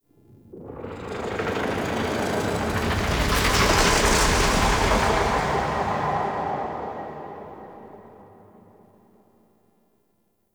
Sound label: Pulsating Whoosh; Warframe; Ambience; Entrati